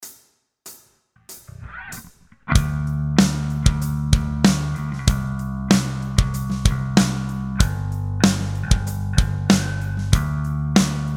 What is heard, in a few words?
Backing Track; rock guitar mastery